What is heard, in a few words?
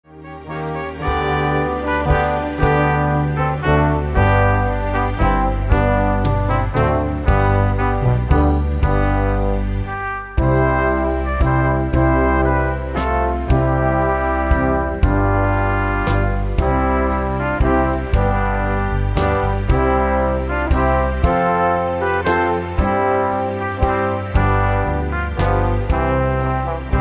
pastorale in brass